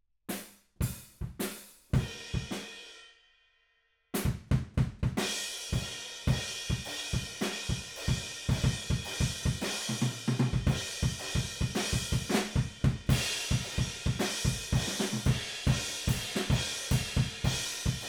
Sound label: Room